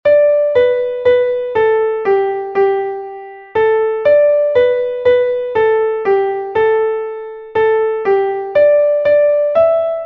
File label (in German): seht unsere laterne, klavier